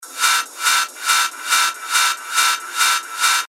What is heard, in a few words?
techno loop 140 BPM; Krachtig; revealed techno top loops vol 8